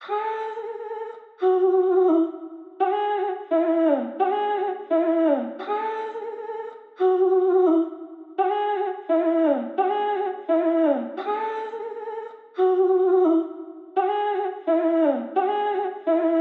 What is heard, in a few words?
Vocal Chop, 172 BPM A Maj; Rendezvous; Cymatics; Infinity Vocal Collection